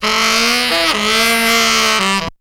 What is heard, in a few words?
SAX LICK; BEAT2000